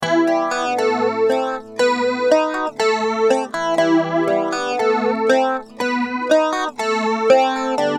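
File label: acoustic guitar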